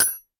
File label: impact metal small; sound effects one